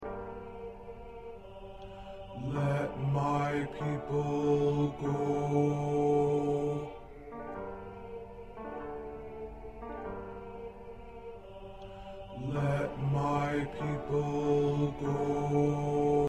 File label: SATB Bass